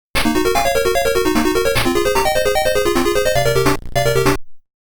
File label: FANFARE